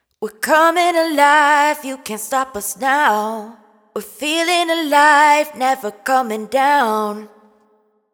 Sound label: hook Coming Alive 128 Fm wet, Vocal; Slate Digital Omnivox Vocal Sample Pack